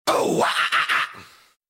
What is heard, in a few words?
oh wa ah ah ah vocal only